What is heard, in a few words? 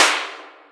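Light Shot, Boss DR660; drum machine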